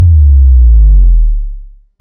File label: vine boom